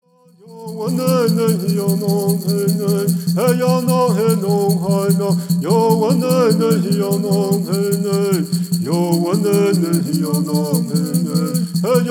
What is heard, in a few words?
Straight Song